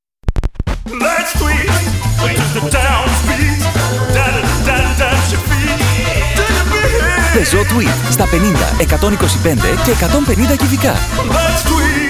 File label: Singing Reel